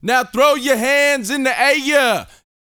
VOCAL F; ILIO

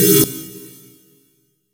Lead C3; Zero-G Club Techno

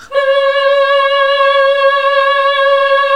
HMM C#3, classic vox

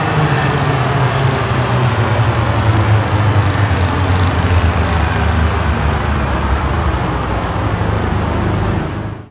weird; Best Service; XXL Production Effects; Sample CDs

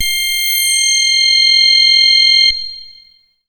MASS LEAD, Synth; InVision Interactive; Keith Emerson; The Most Dangerous Synth and Organ; SYNTH LAYER

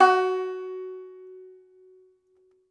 Tenor Banjo, Plucked Strings

